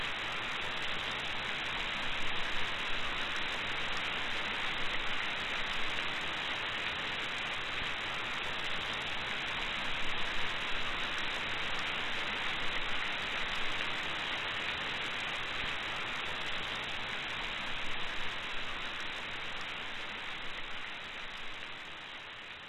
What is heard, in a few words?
rain drizzle